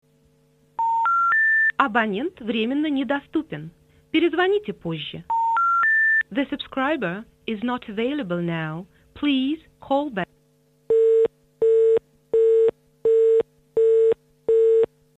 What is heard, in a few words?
telephonn robot